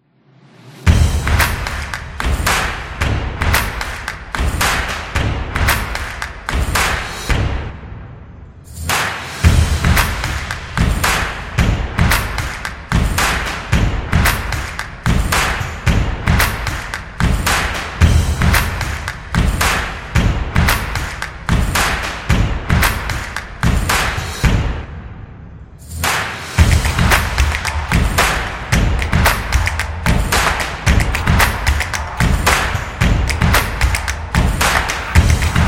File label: Big Drums; drums trailer